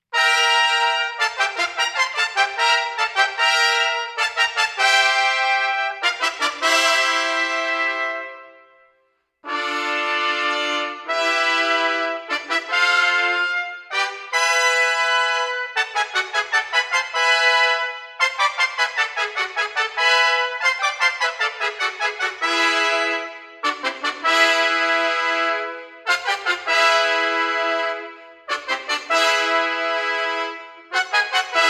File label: horns trumpets